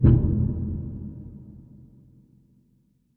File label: Impact cinematic muted wooden hollow slow attack reverb